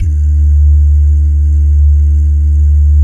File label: "doo", Vocal Jazz Fx